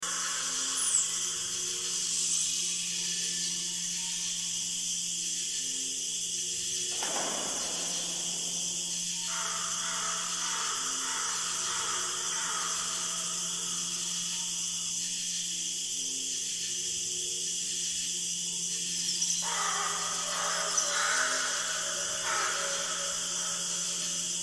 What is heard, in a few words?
ambience overgrowth, verschiedene Vögel und Insekte; portal 2